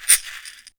KACHICHI; Univers Sons - Raricussions

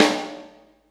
SD, 180 BPM; Ueberschall; Drum & Bass Flash Trackz

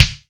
KICK, GM drum set; AKAI S-Series CD-ROM Sound Library VOL-8

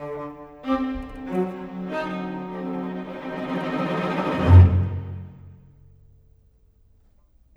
Bass, Cello Viola, Cinematic; Cinematic 07; Orchestral Series

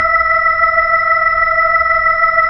B-3 Chorus

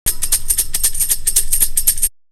Percussion; Coldcut's Kleptomania VOL1

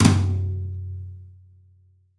New Brush Flam Tom, Roland BK-5

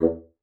bassoon, E2; Xsample VOL-6

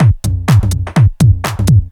loop 125bpm, House Drum Loops

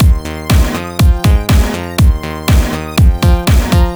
121 Full; 121bpm; Nu Dance Electro; Zero-G; Soundclash; Acidized Wavs; Combi Loops; Slap n Tickle

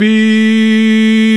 VOX, Male Scats

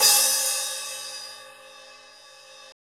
HH HH

